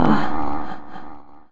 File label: uh huh u, female singing